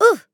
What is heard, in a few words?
Grunt Jump; paladins; Seris